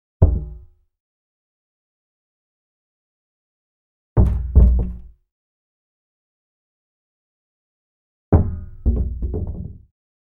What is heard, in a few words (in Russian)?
udar kuvaldoj